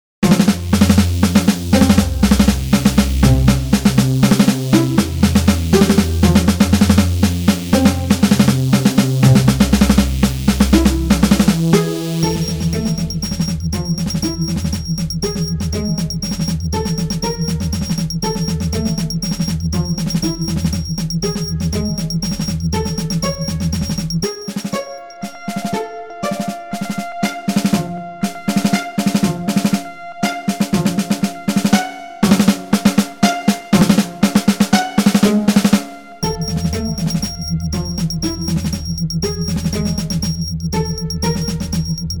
etude snare drum synth 120 bpm

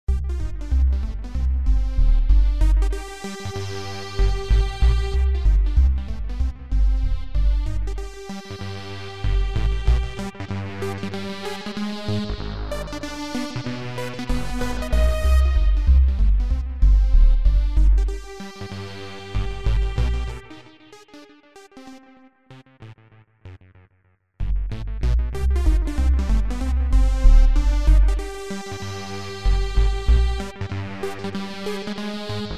synth 90 bpm; etude no 13

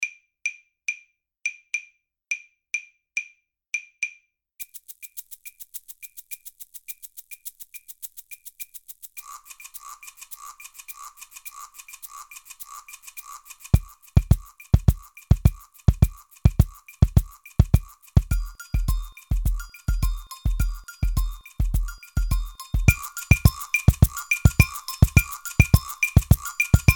mini samba

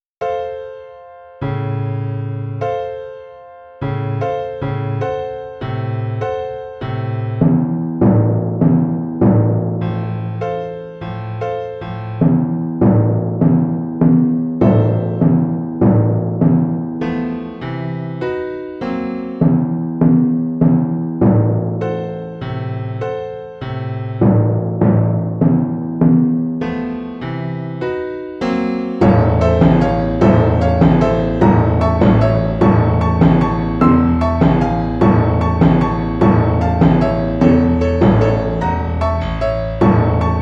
piano timpani

